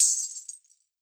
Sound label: MIXED PERC; 90 s Sample CDs